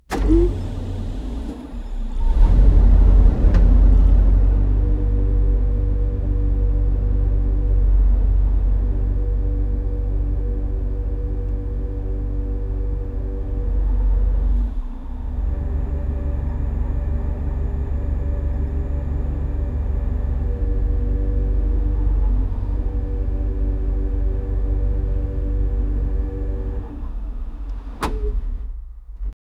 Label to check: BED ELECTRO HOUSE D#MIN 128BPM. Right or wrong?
Wrong